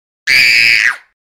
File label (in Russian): visg detskiy